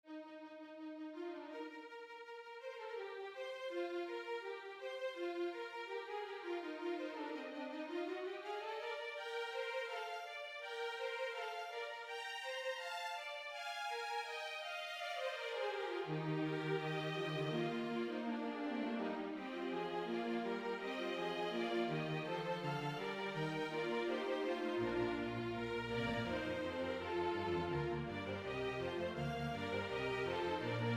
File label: ouv flute